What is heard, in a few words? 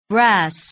brass